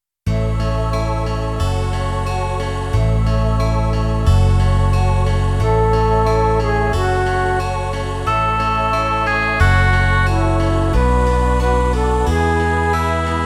backing; Lamb of God 1

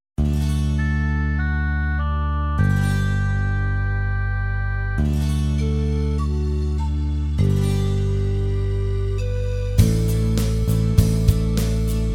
We proclaim your death backing; Backing tracks

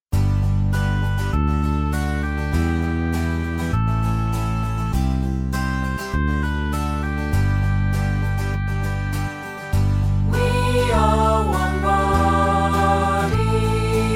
We are one body SHORT vocal version

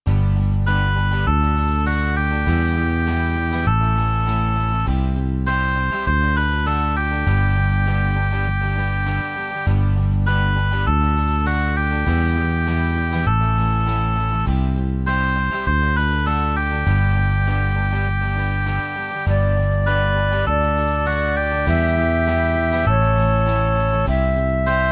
in G BACKING; We are one body in G short

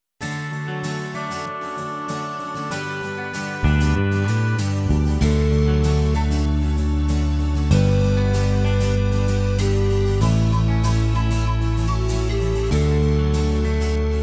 Lamb of God backing; Backing tracks

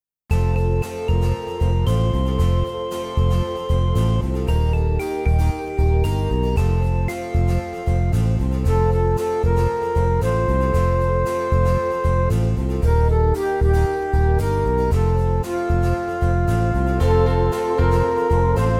BACKING; Let the Lord enter

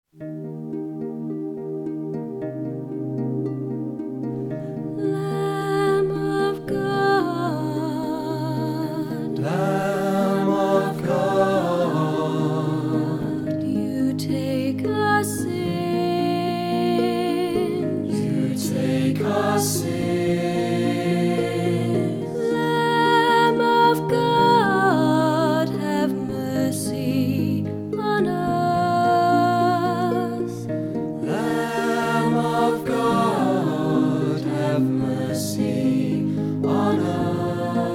pop; Lamb of God

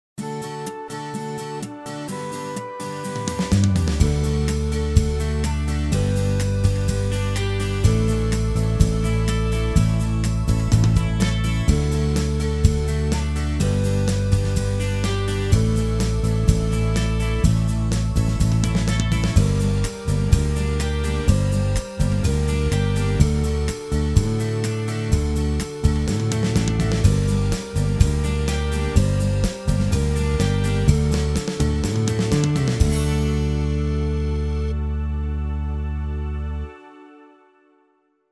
Glory to you repeated backing; Backing tracks

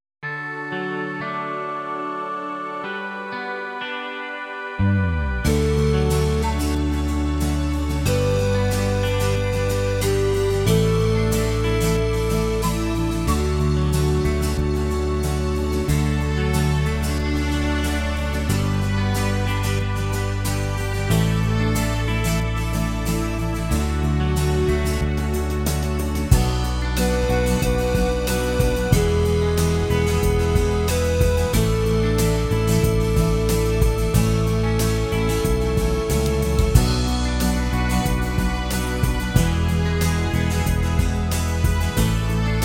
Kyrie backing; Backing tracks

